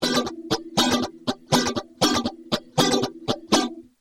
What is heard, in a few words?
rhy gtr